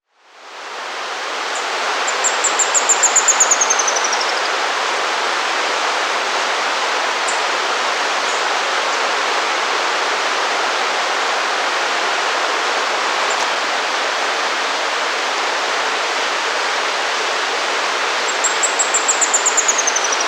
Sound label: Ash-Browed Spinetail (Cranioleuca Cy; S. America